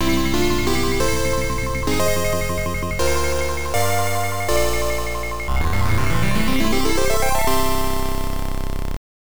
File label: Game Clear; bomberman 94; turbografx 16; pc engine